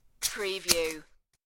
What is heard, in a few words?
Quick Paper Cut